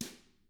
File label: DW1BR PR L, Drums, BRUSHR